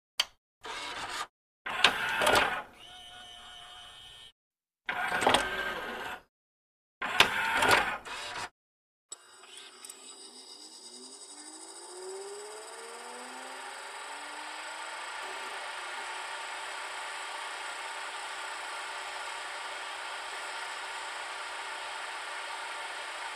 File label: Laser Disc Player; Laser Disc Player Movement; cd player